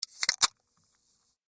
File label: soda can open